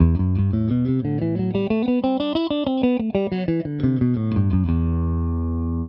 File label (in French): Mi Aeolien, Guitare; T M2 b3 P4 P5 b6 b7 ( Penta min + M2 & b6 ); Accords compatibles : Mineur ou Mineur 7